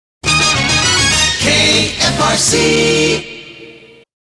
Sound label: Music Sting Into Sing, Jingles; TM; KFRC-SF